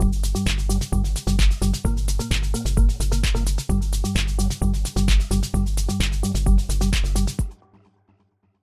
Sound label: Riddim loop with Gurgler Preset; 如何制作 Tech House 音乐; 在轨道上加载Phasis，并选择Special FX > Gurgler预设。